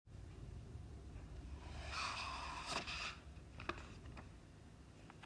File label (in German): Buch umblättern